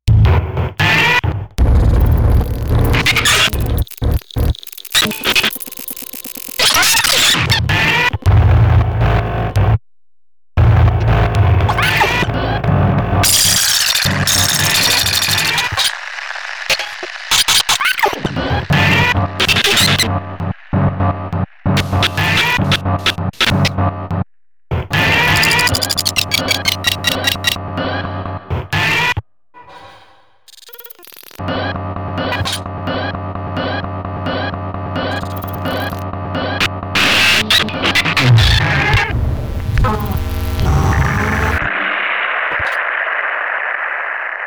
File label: Violin; Violin II